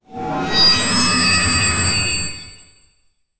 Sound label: magic spell sound effect, casting